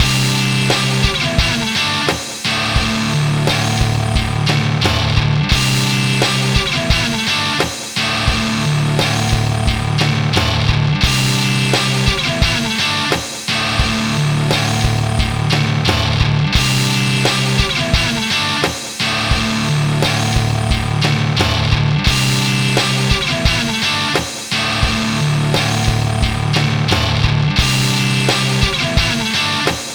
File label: Power Rock Riff